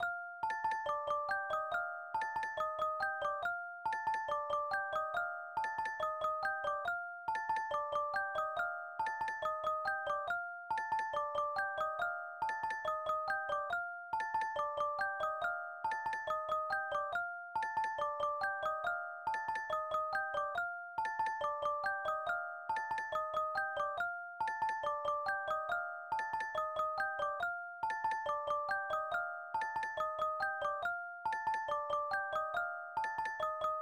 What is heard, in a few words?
Music box